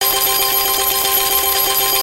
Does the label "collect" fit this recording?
Yes